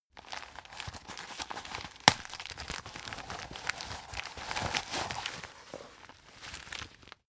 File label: plastic bag handle aggressive